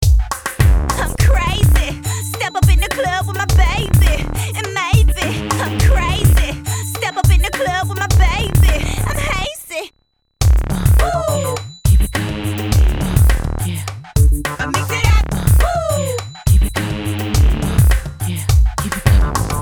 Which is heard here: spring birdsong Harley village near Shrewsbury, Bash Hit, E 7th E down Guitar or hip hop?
hip hop